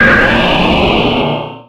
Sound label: pokemon cry